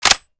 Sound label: inspect bolt release, arms; emx atlas